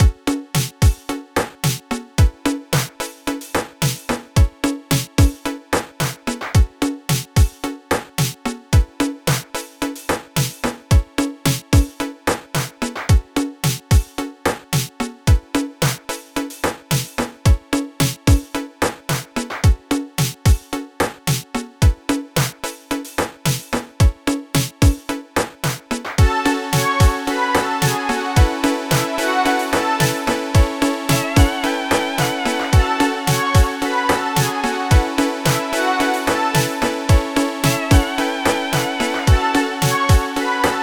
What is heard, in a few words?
80s beat